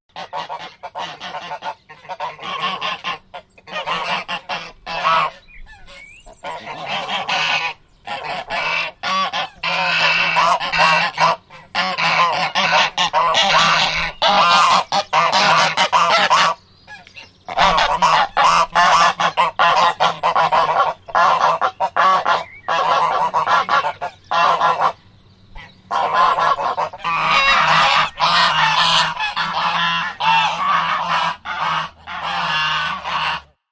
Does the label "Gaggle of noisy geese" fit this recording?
Yes